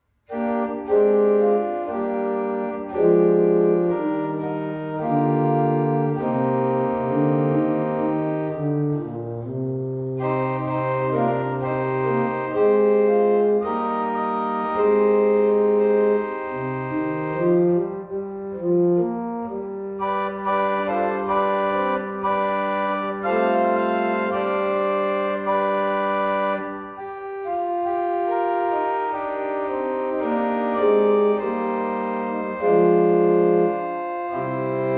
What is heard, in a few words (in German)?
Orgel